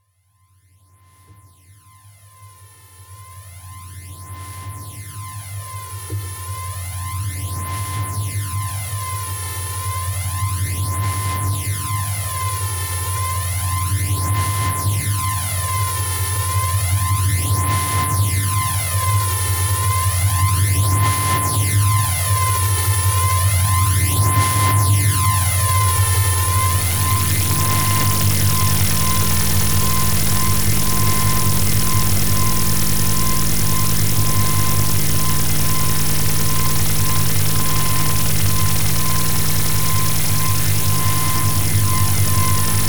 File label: Red-Brown Noise